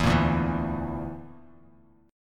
D Suspended 2nd Sharp 5th D, Bass Standard; Dsus2#5; strummed; JGuitar

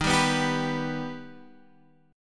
G Augmented

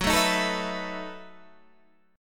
E Major 7th Suspended 2nd Suspended 4th

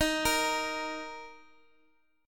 D sharp 5th D sharp Guitar Standard; arpeggiated; D#5; x,x,x,x,4,6; JGuitar

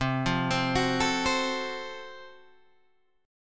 Gb Minor Major 7th Flat 5th C

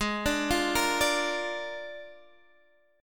Bb 7th Ab

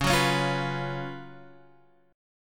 Gb Major 7th Suspended 4th Db, guitar

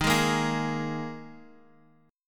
Eb Suspended 4th Eb, down, Guitar Open F; Ebsus4 strummed; JGuitar

